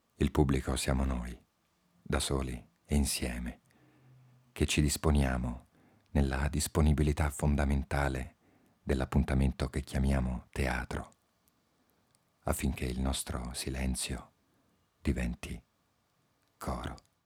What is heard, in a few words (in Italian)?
Pubblico